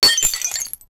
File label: sharp brittle glass like, breaking